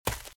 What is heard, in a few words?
Footsteps Leaves Sprint Left; RadwinIsland